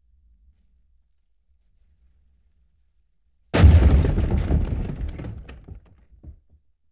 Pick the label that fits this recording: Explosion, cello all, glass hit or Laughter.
Explosion